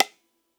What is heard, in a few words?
khol te, khol